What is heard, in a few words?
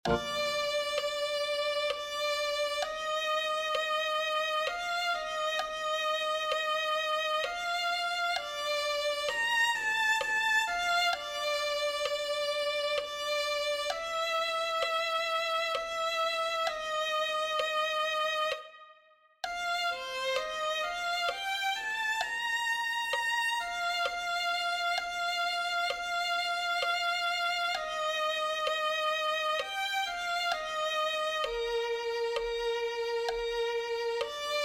violin